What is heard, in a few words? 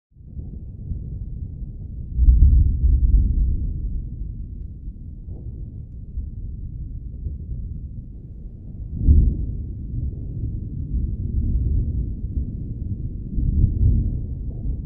Thunder crackling to rumbling distant perspective